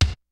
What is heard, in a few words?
kicks snare, kid; Kid Nepro; Elite; KICKS SNARES